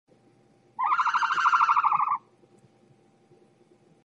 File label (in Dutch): struisvogel